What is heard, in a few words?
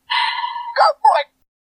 Wiimote Sound; Pokémon Trainer